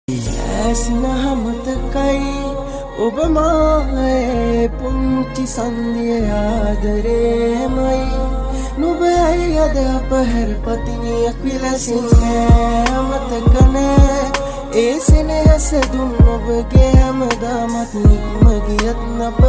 Rap Song

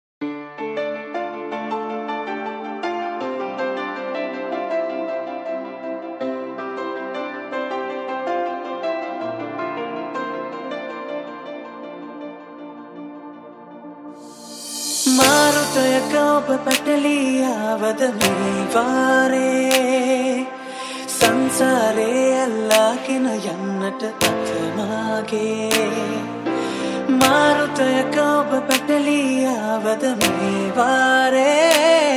Hip Hop Remix, Thunder Bass

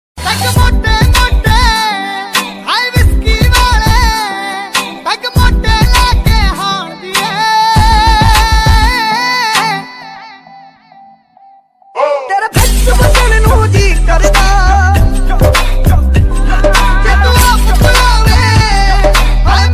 Ringtone

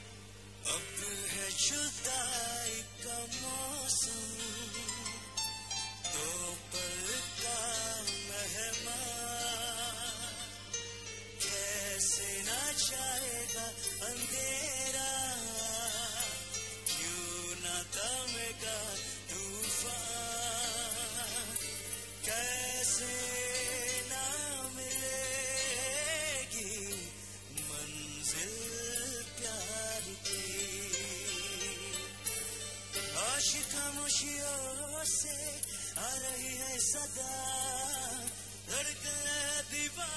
Hindi Songs; Neth FM Mega Fire Live In Akuressa

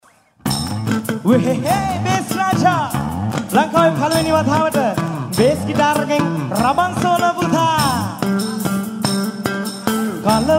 Live In Padaviya

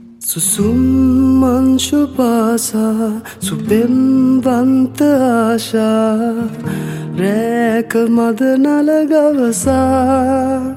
Voice & Guitar Version

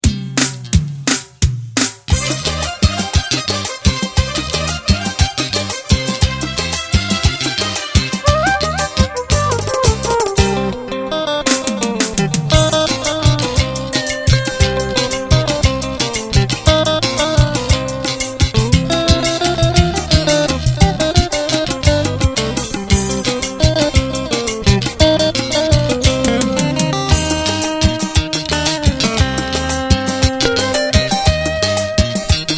Sinhala Instrumental

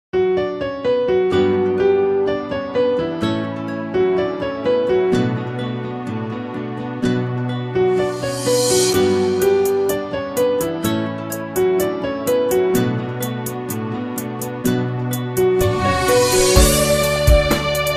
Sinhala No Voice Karaoke Track

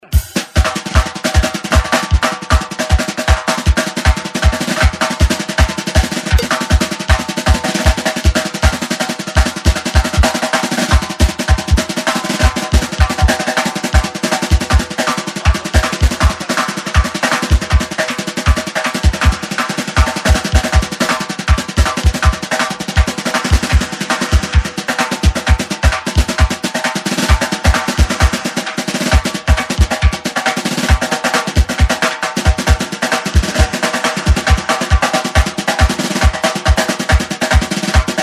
Drum Solo; Shaa FM; Sindu Kamare

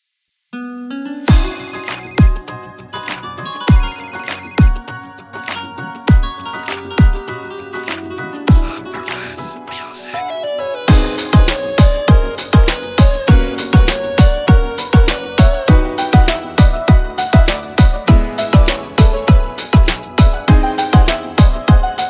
Hard Style Hiphop Mix

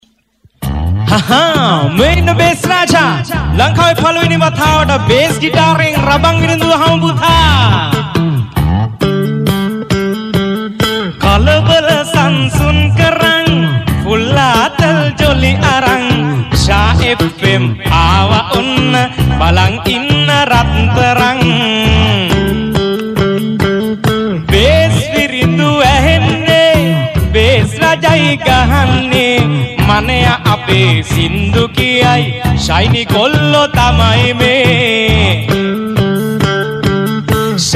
Bass Raban; ShaaFM; Sindu Kamare

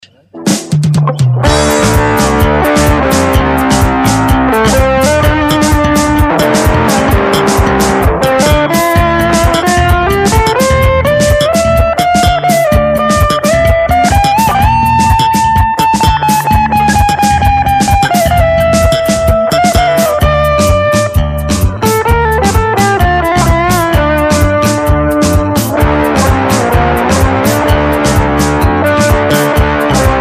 Solo; Shaa FM; Sindu Kamare; Akuressa Expert

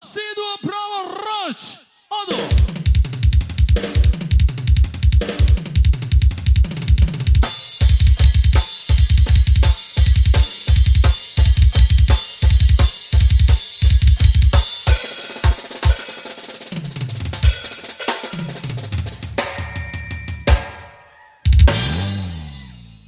Drum Solo; Live In Kanthale

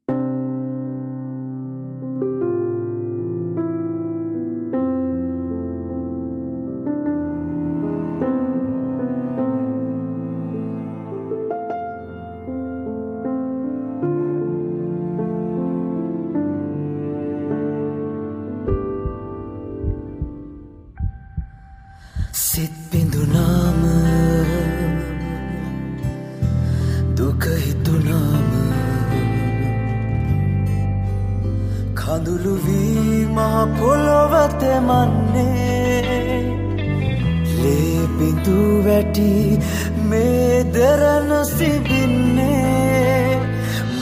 Song

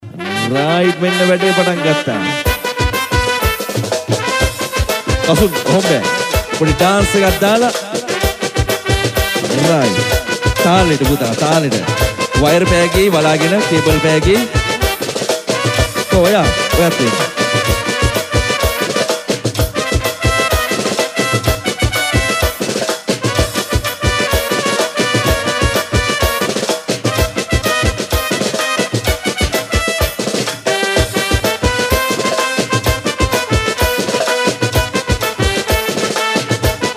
Papare; ShaaFM; Sindu Kamare; Bandaragama

